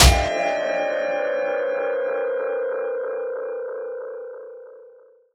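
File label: SYN left, Production Effects; Best Service - XXL Production Effects; SYN-FX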